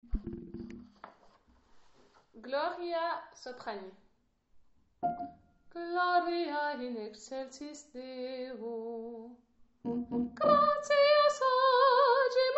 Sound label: haydn gloria sop